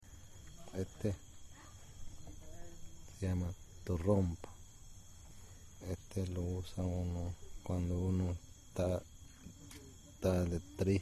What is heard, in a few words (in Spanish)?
La trompa